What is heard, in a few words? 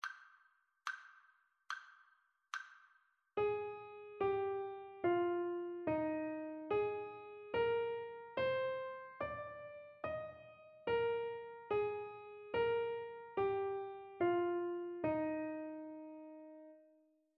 melodic dictation